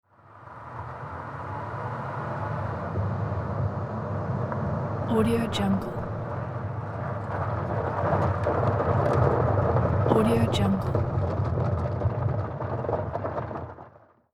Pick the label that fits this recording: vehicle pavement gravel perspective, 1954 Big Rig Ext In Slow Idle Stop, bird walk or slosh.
vehicle pavement gravel perspective